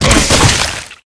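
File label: guardian beetle attack, monster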